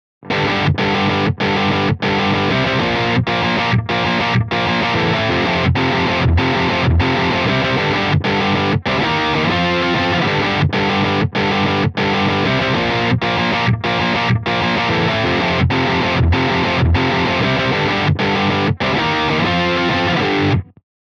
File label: cab Celestion